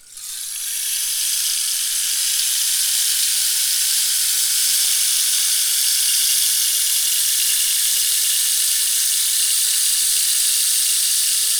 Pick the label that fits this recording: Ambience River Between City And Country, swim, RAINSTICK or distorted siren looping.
RAINSTICK